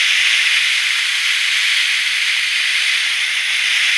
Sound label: Painting Paint Loop